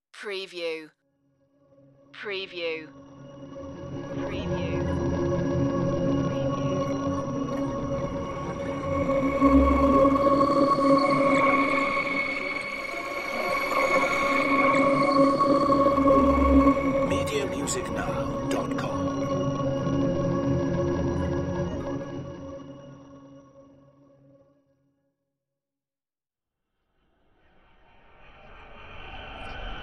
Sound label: digital passing swooshes